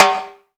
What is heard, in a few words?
TIMB GATE H, TIMBALES; Cuba; AKAI S6000 CD-ROM - Volume 5